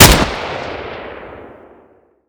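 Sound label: wpn fire ppsh player; weapons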